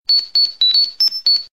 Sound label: Pager; Power Rangers